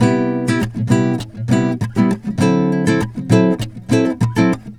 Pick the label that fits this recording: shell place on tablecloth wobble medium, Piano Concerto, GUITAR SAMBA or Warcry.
GUITAR SAMBA